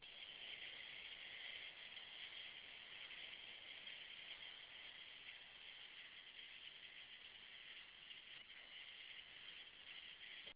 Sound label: Cicadas, Arkansas; Motorcycles